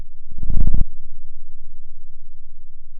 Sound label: pop, Sound of a cat purring